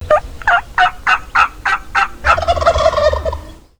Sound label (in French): dinde et dindon